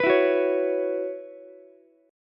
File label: B Suspended 2nd Suspended 4th, Ukulele; Bsus2sus4 strummed; JGuitar